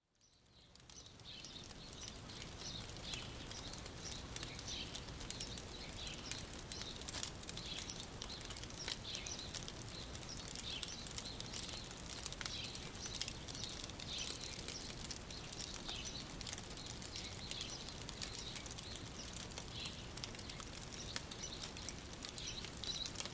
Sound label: Ambient; Age of Empires III; cinematics